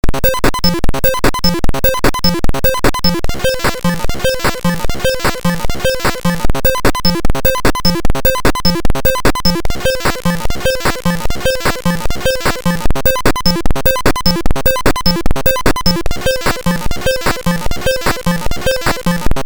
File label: weird loop